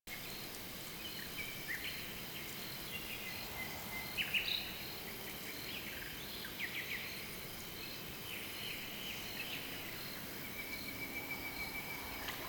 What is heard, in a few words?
Camp Night Ambient Loop; Warframe; Quests; TheNewWar; Ambience; Drifter Camp